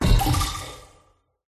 futuristic echo game pick up magic spell cast conjure craft mobile app special